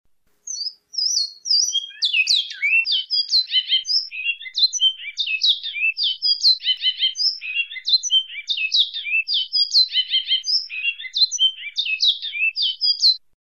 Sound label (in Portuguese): canto bicudo